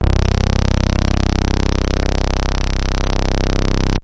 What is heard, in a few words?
fat 8 tone, Synth